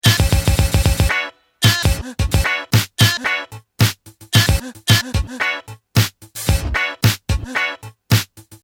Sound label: loop mono